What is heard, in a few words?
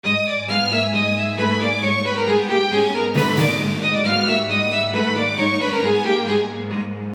Short Gothic